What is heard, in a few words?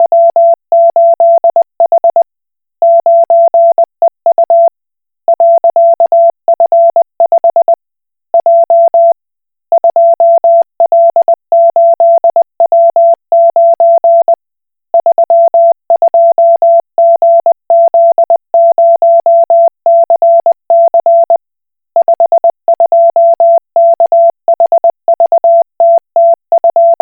Koch Morse